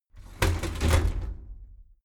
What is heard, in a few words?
shower slide door inside close regular halfway